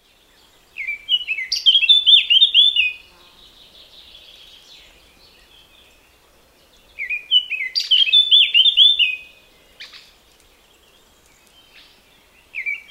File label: blackcap